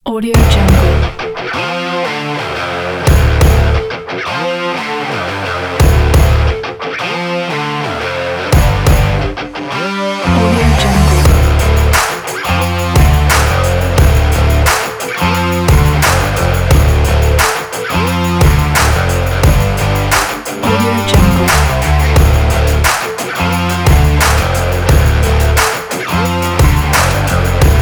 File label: the energetic rock; inflectionpoint